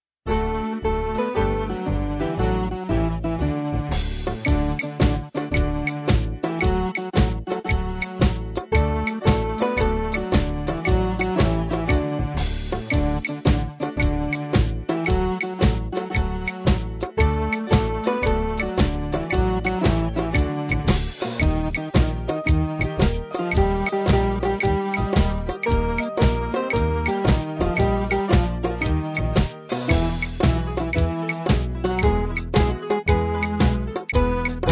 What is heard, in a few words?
music